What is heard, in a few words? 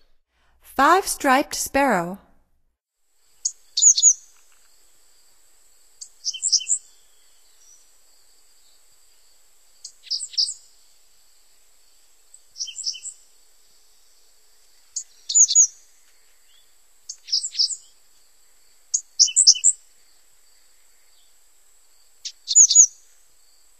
Five-striped Sparrow, Songs of Birds of North America; Stokes